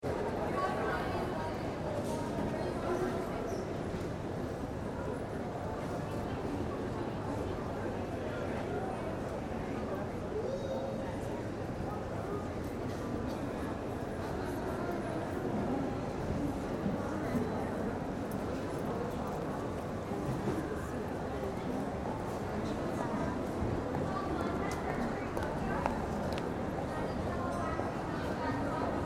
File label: Crowd Open Atrium Busy, Hospital; Sickkids; Hospitals; Big Room Sound